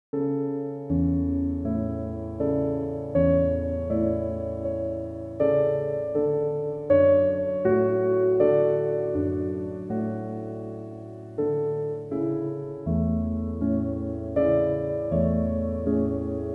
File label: Peaceful Piano; Exam Study; Classical Music; Chillout